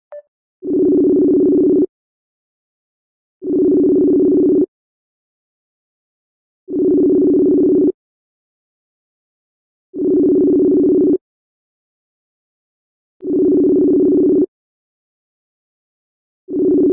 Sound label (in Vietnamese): am thanh khong nghe may messenger